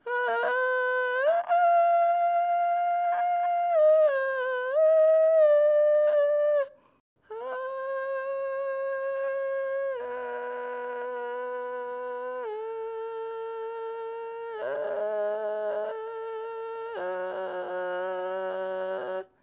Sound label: pilgrim old female sing; Hollow Knight: Silksong